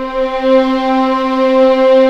C4A LEG P, VIOLINS; East West; The Ultimate String Collection

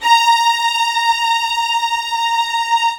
SUS A#5, LOUD VIOLINS; Q Up Arts; Denny Jaeger Master Violin Library

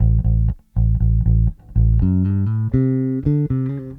Bass, 70s Analog Funk

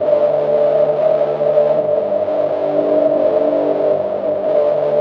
FUZZ TONES; Spectrasonic Distorted Reality 2

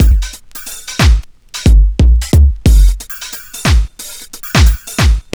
TRIP 90, trip loop

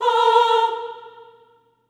Staccato F A#4 L, Symphony of Voices; Spectrasonics

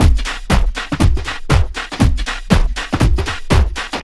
house loop

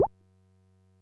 Chime; classic machines; talam

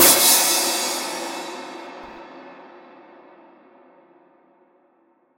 Crash, impact samples

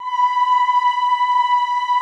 AHH B4, Classical Choir; Best Service; Extended Classical Choir

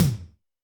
Tom Wet Hex, Alesis D4

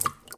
Tape Drip, household rhythm samples hits

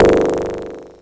blips, opl3

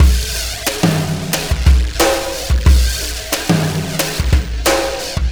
System Overload Beat, Processed Drumkits

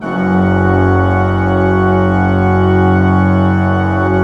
PLENO F1, Cathedral Organ, PEDAL PLENO; Propeller Island

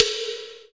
FX DRM, Analog; Sound & Vision - Gigapack II

